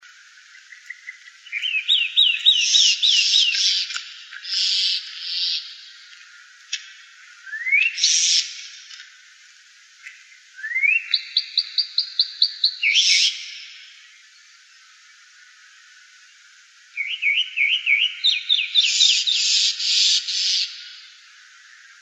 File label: MIMUS